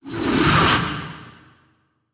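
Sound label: Whoosh Flush It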